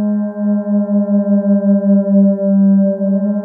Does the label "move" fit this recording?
No